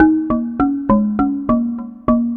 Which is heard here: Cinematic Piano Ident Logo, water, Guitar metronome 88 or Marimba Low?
Marimba Low